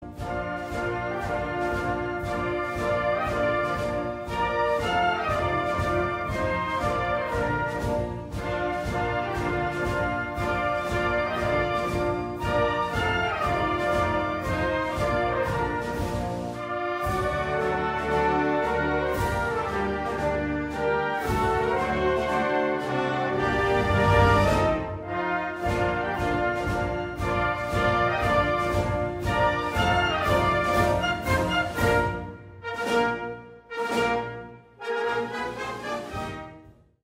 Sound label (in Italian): Alzabandiera